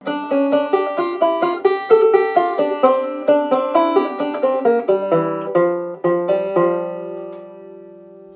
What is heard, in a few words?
Beaumont rag ending, banjo